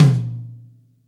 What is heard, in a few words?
verby tom drum sample B key